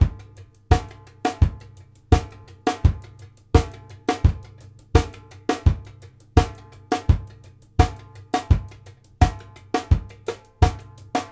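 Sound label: four on the floor, drums; Sampleheads; New York City Drumworks VOL-1; SP BLASTX 84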